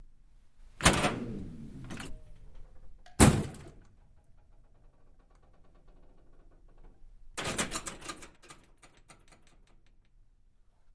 Door Push Bar Wood Do

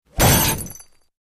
Crash Metal Glass

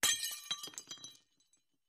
Glass Breaks Distant; windows & mirrors

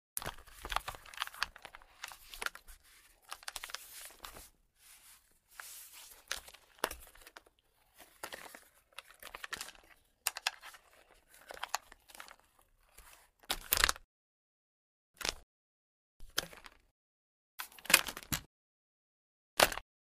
22 Ruger Rifle Movement; foley gun movement

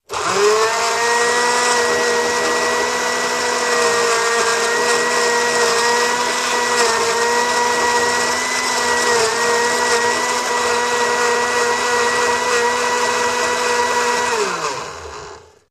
Blender Strt Run Stp, appliances

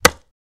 Staff Wood Hit

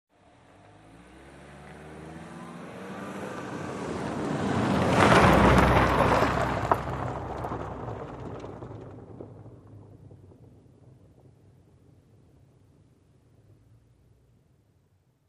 Sedan Pavmt Grvl Med

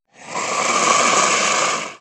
Puma Cougar Hiss Long, big cats cougars

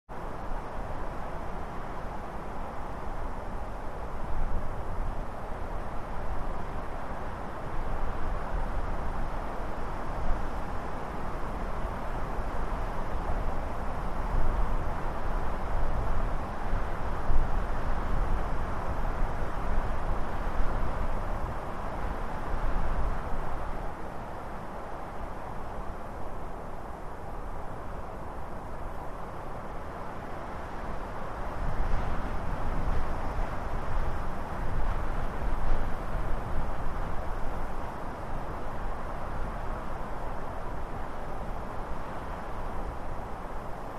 Mountain Wind Pine

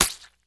Impact Small Wet Spl, splashes

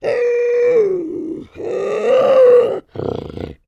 Bobcat Snarl, Menacing. Vocal. Close Perspective.; big cats

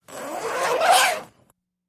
Tent Zipper Long; zippers

